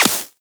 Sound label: Laser Impact Flesh; Warframe; Bullet Impacts